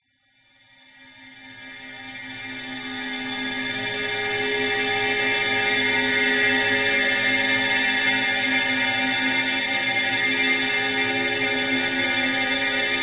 science fiction atmosphere ominous airy metallic shrill whining